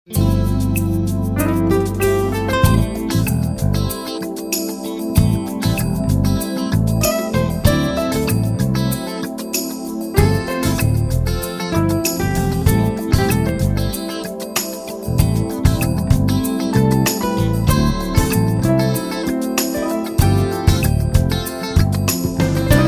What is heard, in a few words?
smooth jazz